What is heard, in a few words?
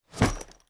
rucksack put down